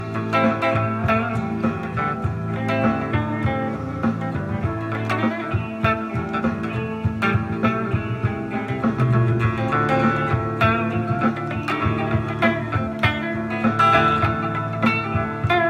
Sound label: Blues, ringtones